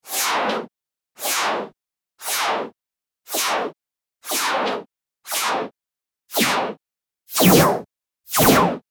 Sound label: swooshes of multiple arro; arrow